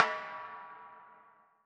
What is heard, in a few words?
perc percussion; RockScience